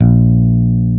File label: HARD FINGE; E-MU Formula 4000 Series Vol. 3; Analog Odyssey